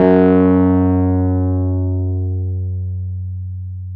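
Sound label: piano Wurly; PNO; Roland LCDP10 Keys of the 60s and 70s 2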